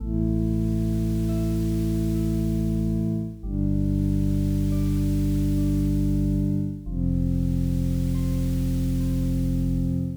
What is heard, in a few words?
ambient calm 70bpm loop